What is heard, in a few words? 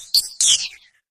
Harsh Chirps